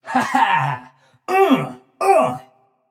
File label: nv yay; smite; xbalanque